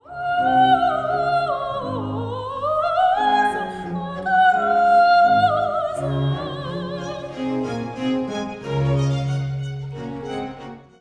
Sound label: Vocal